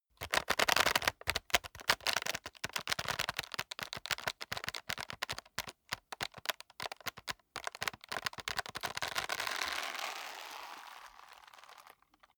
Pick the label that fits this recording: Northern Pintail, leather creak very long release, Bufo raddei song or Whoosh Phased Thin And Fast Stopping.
leather creak very long release